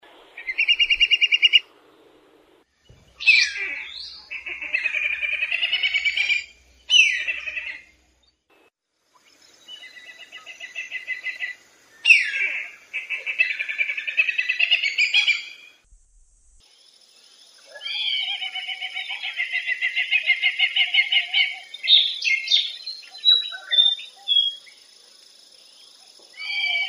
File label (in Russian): korshun svistun